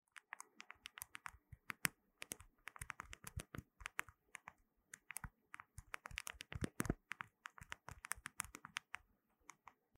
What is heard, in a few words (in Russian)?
Звук набора текста смс на старом nokia